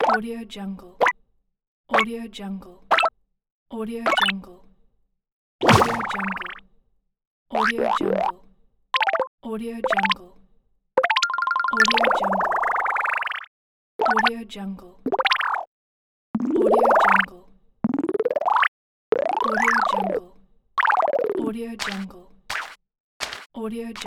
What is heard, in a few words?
bubble match 3 sound pack; audiochemicals